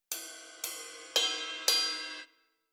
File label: Jazz Stick Ride, Rhythm